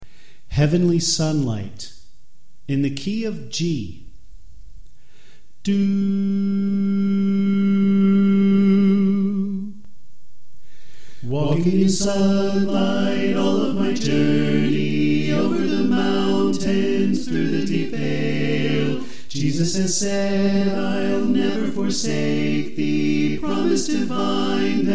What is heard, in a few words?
Soprano Left